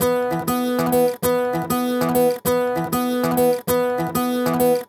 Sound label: Traditional Guitar 098bpm E, African Guitars; Quazibeat - African Secret